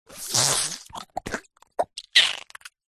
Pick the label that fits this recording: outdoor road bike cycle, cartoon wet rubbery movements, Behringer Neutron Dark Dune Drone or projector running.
cartoon wet rubbery movements